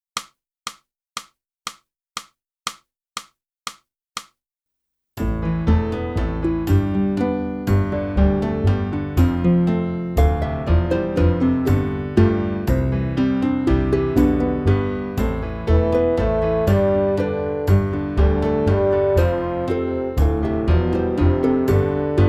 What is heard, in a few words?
flute; boosey